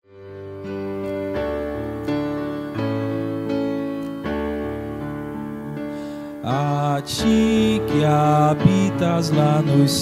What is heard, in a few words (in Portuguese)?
salmo cantado